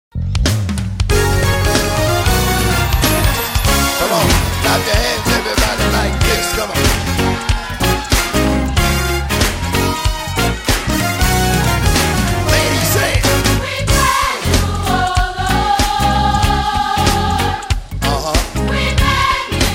Clap Your Hands

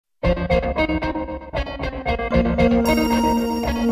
Sms Atm

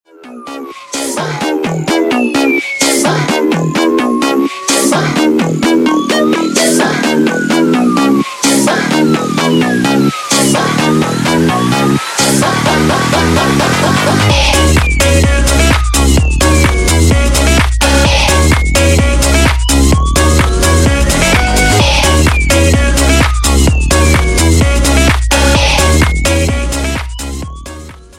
Electro Mix

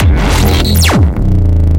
Gut Punch

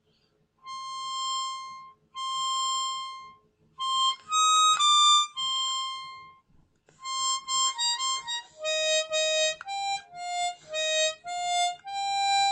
Harmonica

